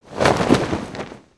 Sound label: Pirate Roll Over; gnarled forest